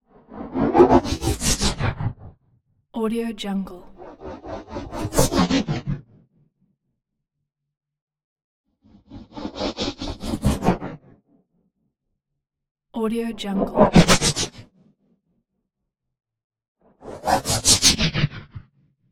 wooden blades fly tubular whooshing pass bys

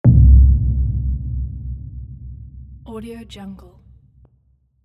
big release sub hit

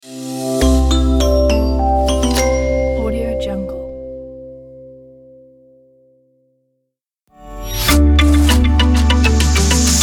elegant logo reveal pack

